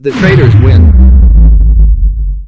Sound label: win traitors; cstrike; jailbreak